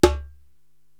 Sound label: Djembe-Mute; Korg TR-Rack